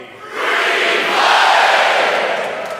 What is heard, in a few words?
green flame